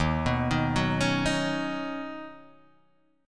Eb-Minor 7th Sharp 5th Eb down Guitar Half Step Down; arpeggiated